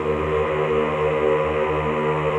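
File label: Choir ES1, Ehh; Eeh + Ehh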